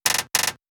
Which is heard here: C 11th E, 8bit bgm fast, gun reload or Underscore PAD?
gun reload